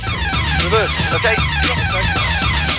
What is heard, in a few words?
penalty